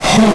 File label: jump; Alien Arena; nemesis